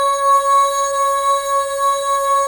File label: SYN NEONP; Sound & Vision - Gigapack I CD 2; JD 800/990